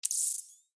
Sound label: subtle tech interface